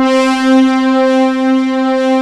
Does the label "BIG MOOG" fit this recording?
Yes